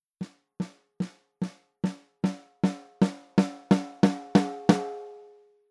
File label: Controltrack swell, snare drum; preamps